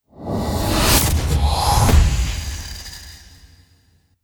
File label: Ghost Spectral Wave